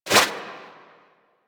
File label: Tengu turbulence deflect; Warframe